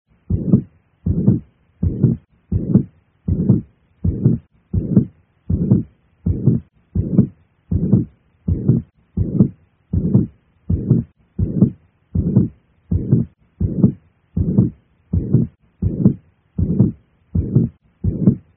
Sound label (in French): regurgitation mitrale, Coeur